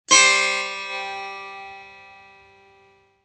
setar do

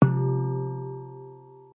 hang

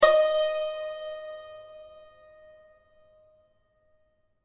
santor mi

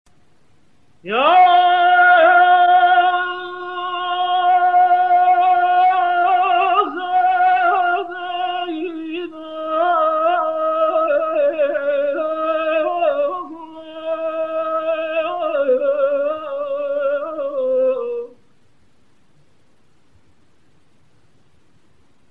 hejaz, aboata

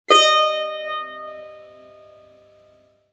tar, "mi" b

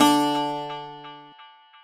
baglama re; baghlama

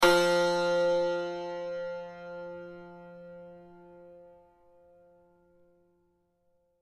santor fa

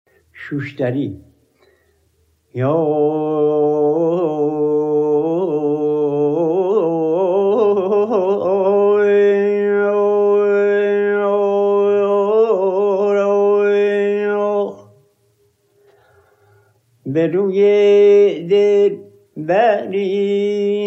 shoushtari; homayoun

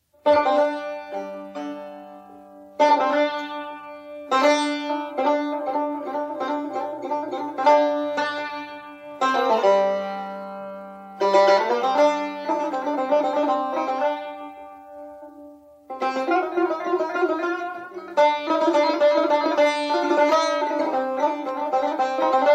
daramd; shour

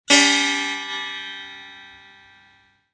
setar re c

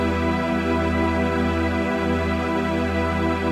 mi minor; playback

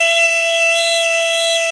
ney fa

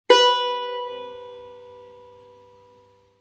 tar si

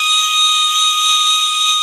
ney mi

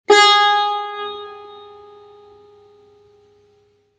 tar la

